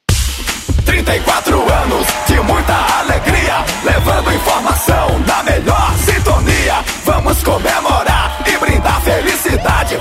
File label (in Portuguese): jingle aniversario radio; 34anos; radio cultura dos palmares; kazam studio